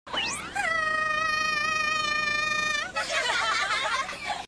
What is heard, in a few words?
goes ahhhh